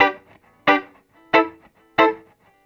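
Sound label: reggae electric guitar B; Best Service Advanced Media Trax Modern Composer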